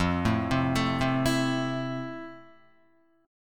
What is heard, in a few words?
F Major 7th Guitar; arpeggiated; JGuitar